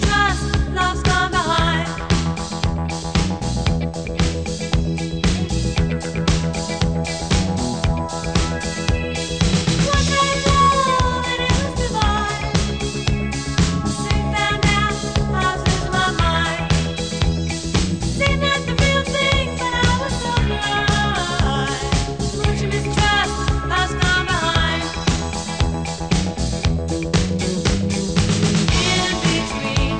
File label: disco